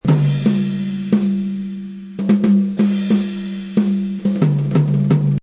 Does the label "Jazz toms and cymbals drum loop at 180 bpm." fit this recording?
Yes